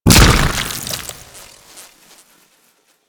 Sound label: shock swarm projectile burst; Warframe; Infested; Shock Swarm Rifle